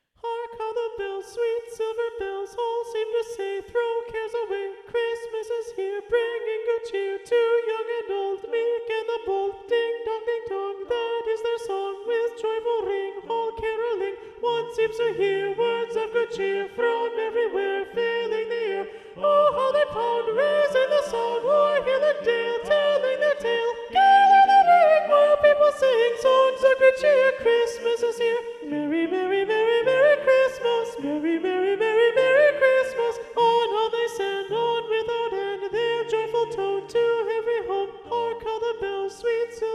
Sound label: Carol of the Bells SATB Soprano Predominant